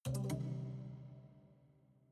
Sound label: haunted strings chord minor damped